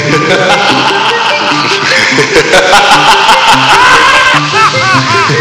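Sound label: LOTS LAUGH, risate; persone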